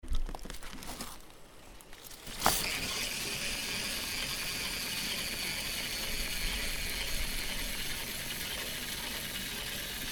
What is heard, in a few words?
trance